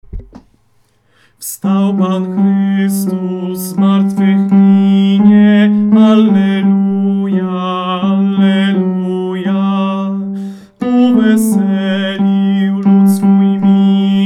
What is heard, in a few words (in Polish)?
tenor; Wstal Pan Chrystus; pasyjne; meskie